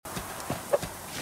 stab; The Flame in the Flood

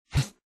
jump; Owlboy; Otus

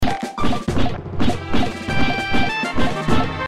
fighting; gamesoundopedia

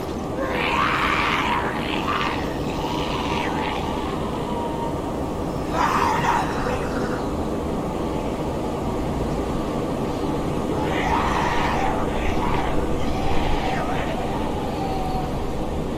monsters; Dark Souls III; boreal valley

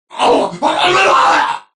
scream; Dawn of War II

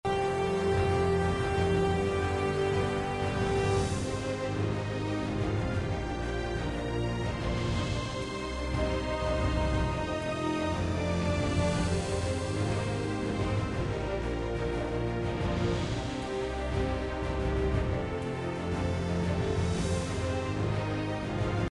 menu; Subway Surfers

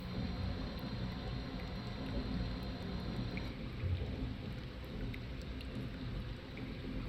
ambience wet cave; We Were Here Forever

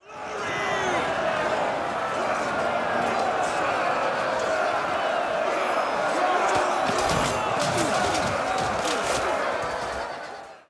battle; rome total war

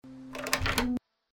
interaction; The Cat Lady

